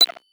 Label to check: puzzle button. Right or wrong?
Right